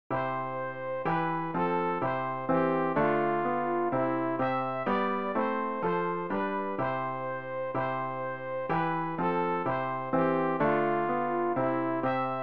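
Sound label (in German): sopran, Übehilfen für das Erlernen von Liedern; ref gesangbuch; rg 278 ich weiss woran ich glaube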